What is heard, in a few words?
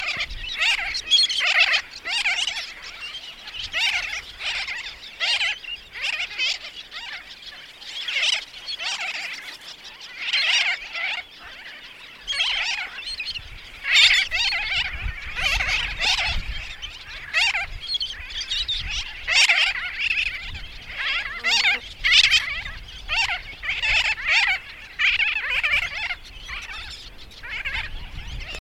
Sooty Tern Onychoprion fuscatus fuscatus